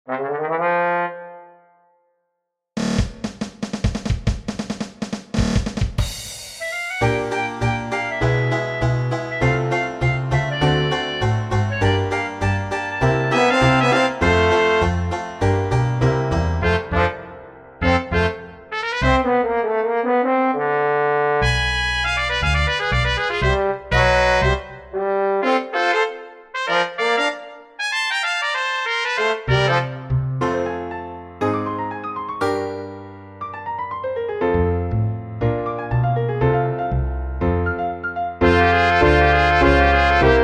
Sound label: dixieland intros, Traditional Jazz Combo